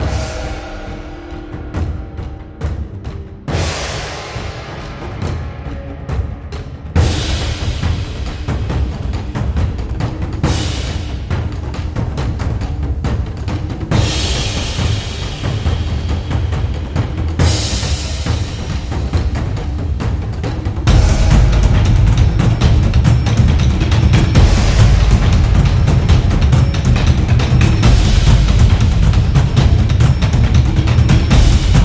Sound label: Japanese Drums